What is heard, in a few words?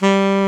SAX A. MP G, Alto Short; Roland LCDP07 Super Sax